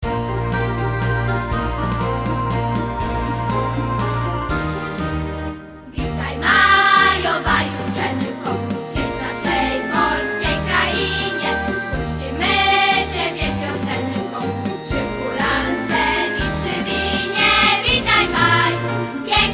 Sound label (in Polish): MAZUREK